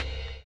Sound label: LO FI OH; USB Soundscan vol.11; AKAI